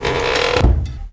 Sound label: DOOR CLOSE; sloodle